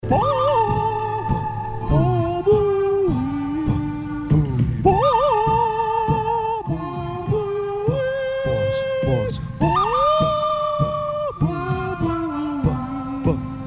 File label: western; baba booey